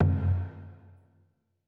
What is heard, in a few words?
PERCLOOP ETHNO 125